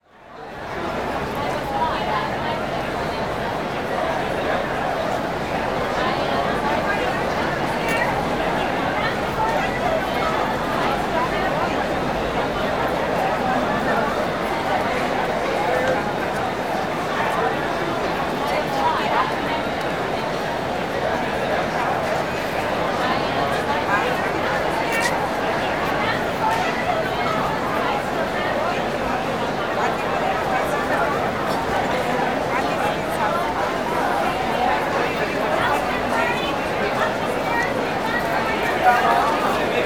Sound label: Station Busy Train, Walla; Sound Ideas; Walla Series